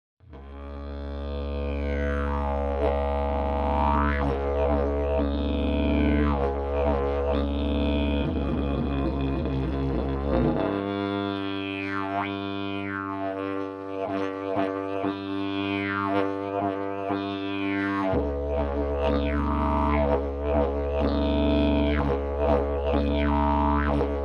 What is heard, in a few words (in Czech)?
jilm riduo, didgeridoo